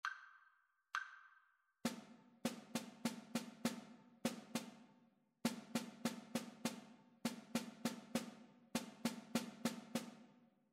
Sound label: rhythmic dictation; ear training